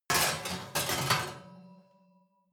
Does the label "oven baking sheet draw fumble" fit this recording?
Yes